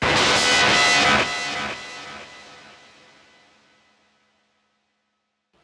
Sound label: abstract fx